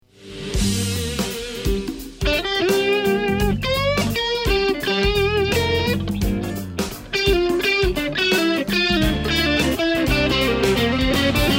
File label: Fender Vibro Champ